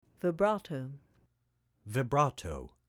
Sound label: Vibrato